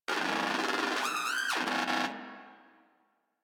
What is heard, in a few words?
Moa Aggressive Vocal Enemy Killed; Warframe